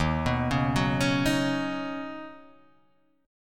D sharp Minor 7th Sharp 5th, Guitar, Half Step Down